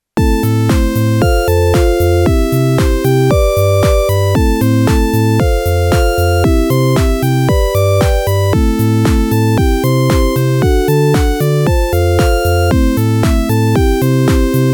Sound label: random sound all